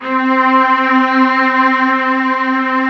mellotron C4

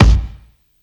Kick, Psychedelic Drums

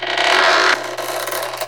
CREAK; SFX

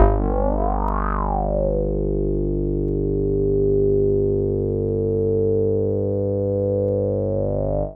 M12 REZ G2, SYNTH; AMG; Global Trance Mission VOL-2

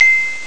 air bell; ST-XX Amiga Soundtracker Sample Packs

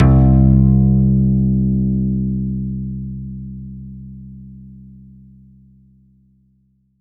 C1, CLUB ORGAN; Roland JV 2080

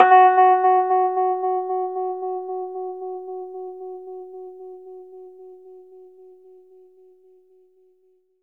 Rhodes fast F#, 3D Keys; 3D Sound; 3D Keys VOL-1

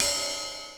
12-BIT RIDE; LITE KITS; Crypton; DrumBase5000

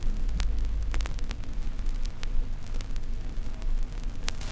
Crackle, Vinyl Noise

